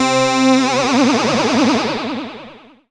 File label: syn c, Dance Synths, Juno; Juno Jam